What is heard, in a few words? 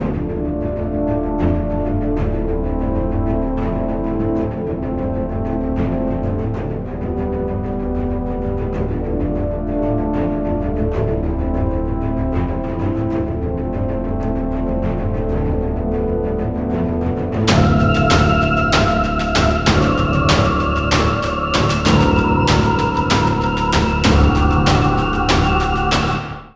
BATTLE, music; pixelheros